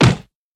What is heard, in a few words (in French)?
Coup de poing